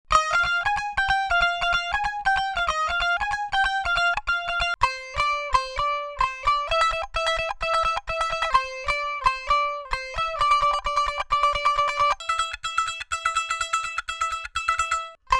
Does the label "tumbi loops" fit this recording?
Yes